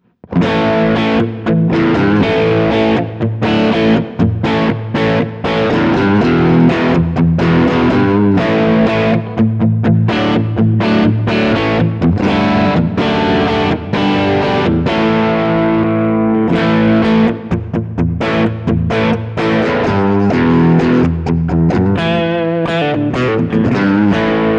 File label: Ibanez RG631ALF Both Pickups Crunch